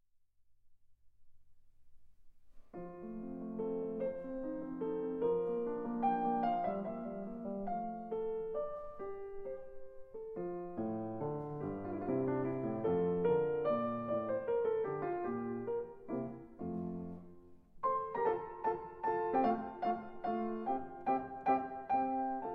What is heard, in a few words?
Piano Sonata in F Major, Allegro